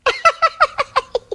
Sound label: hunter shred; huggy wuggy snpc; elmo